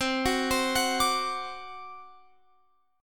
C Suspended 2nd Flat 5th C down Guitar Open F; Csus2b5 arpeggiated

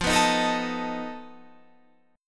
F 7th Flat 5th F down

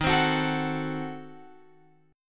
D sharp Diminished 7th D sharp Guitar Open F; strummed

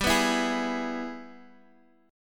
Ab Minor Major 7th Sharp 5th chord

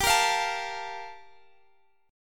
G Minor 9th Guitar Drop D

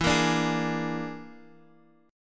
E 7th Flat 5th, Guitar, Drop D; chord shape

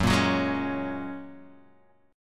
G sharp 7th Suspended 2nd Suspended 4th F sharp

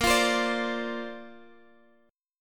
A sharp Major Add 9th, Guitar, Drop D

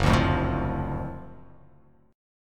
F sharp Minor Major 7th Sharp 5th F sharp down

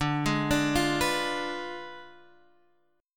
D Diminished 7th D, Guitar, Half Step Down

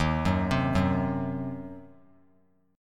Eb Minor 6th chord; arpeggiated

